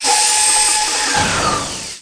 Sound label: ship tail close